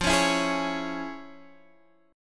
F Minor Major 7th Sharp 5th, Guitar Half Step Down, chord